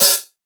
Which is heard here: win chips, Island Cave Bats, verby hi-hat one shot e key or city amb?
verby hi-hat one shot e key